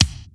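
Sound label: processed kick, drums; Crypton - DrumBase5000; PROC.DRUMS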